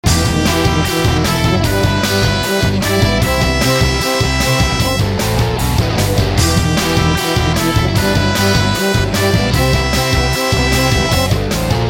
Ska Chorus; Hal Leonard

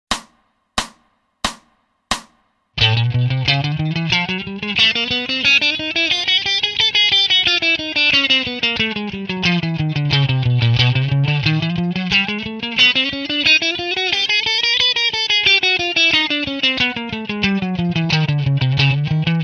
Chromatic Scale 16ths slow

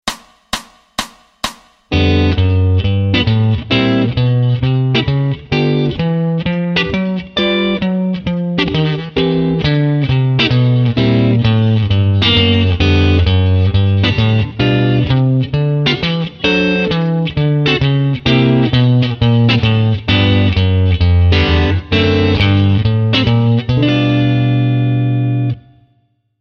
Walk Bass fast

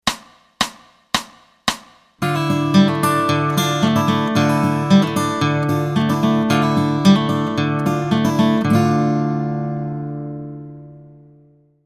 Open Chords With Fingers Dm

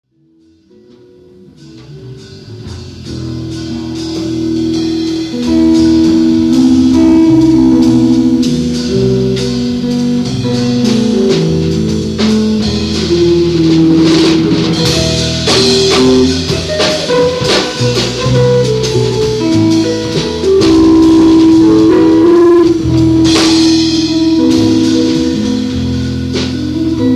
(LIVE); April 1991

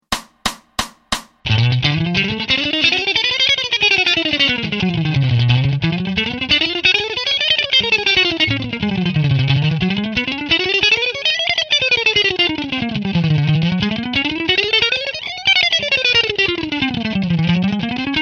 Pick and Fret Hand Sync 16ths fast

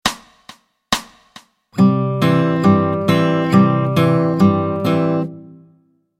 Travis Picking

